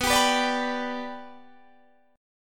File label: B-Minor Sharp 5th, Guitar, Standard; strummed; JGuitar